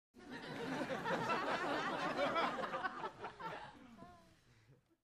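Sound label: Crowd 100 Short Light, medium interior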